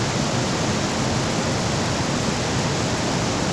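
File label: waterfall; Splashdown; XBOX; Soultree; Amazon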